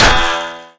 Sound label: guitar hit world; weapons